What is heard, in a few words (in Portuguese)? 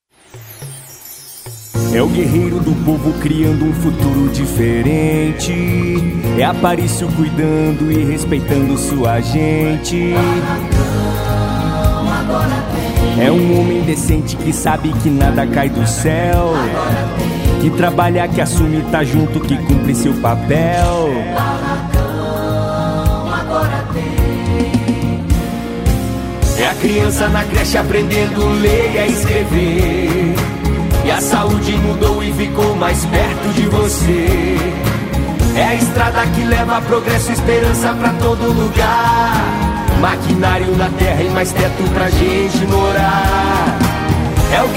Jingle PREFEITO; Guerreiro do povo; Aparicio e Tobias 11; Barracao